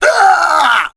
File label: death